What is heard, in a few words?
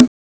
CONGA; CMI